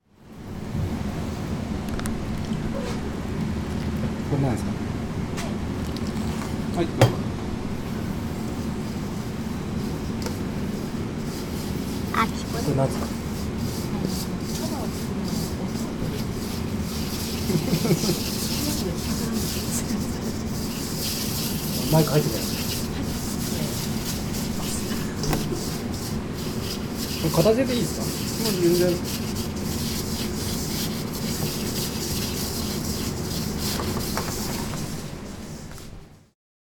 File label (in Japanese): soba ko ni kasui siteru tokoro; そば粉に加水してる所